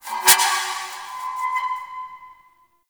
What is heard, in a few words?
Sparks; Warframe; The New War; Zariman